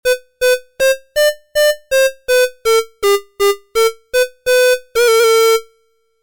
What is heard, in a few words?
flute; micro cosm